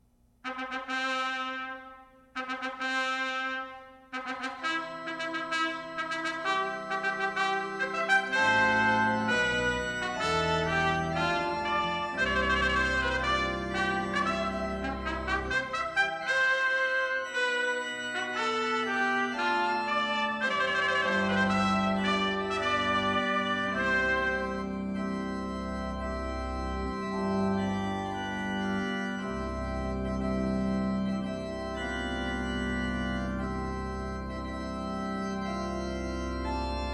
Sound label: Organ; Mendelssohn